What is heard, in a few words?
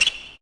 Sneaker Squeak; Sports